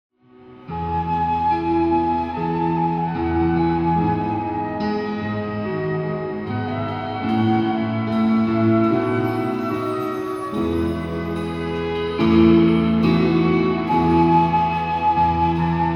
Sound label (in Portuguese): ORQUESTRA